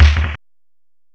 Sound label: SNARE, DRUMS